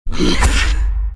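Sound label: Skeleton Archer Attack; Seer; GamigoNA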